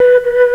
Fairlight CMI IIX, WOODWIND

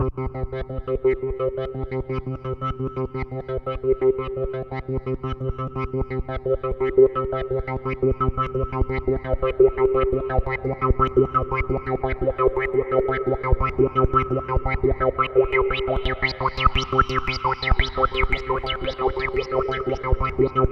C2, Roland JV 2080 CLUB ORGAN

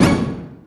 old skool stab

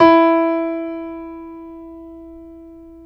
PNO, Grand Pno, Roland

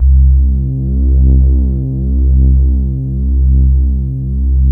BELLY C1; Best Service Alpha Dance vol.2; RESO

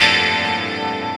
CHORD, Drum & Bass, 130 BPM; Ueberschall; Drum & Bass Flash Trackz

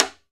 MUTED RIM, Timbale; Timbale Battery

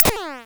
Snare Gun, drum machine; 300 Drum Machines; DevSnd

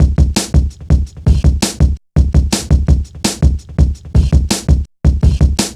Funki Tuff, Jungle, Drum Loops 166.5; Zero-G Jungle Warfare 1, 2 & 3

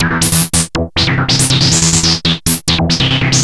Treat Guit, Industrial Nasty

